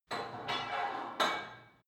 put weight onto barbell reverby quick, fitnessstudio